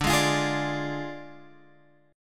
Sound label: D Diminished D, Guitar, Open G